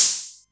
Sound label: Roland MC09 Hat O